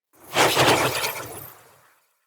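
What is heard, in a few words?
Staff Caster Projectile Whizz By Heavy; Weapons; Staves; Starter Staff Caster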